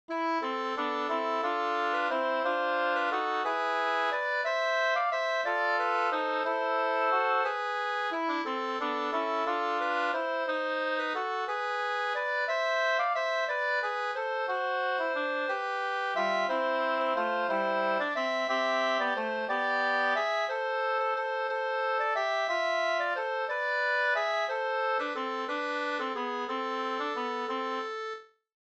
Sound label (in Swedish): vismelodi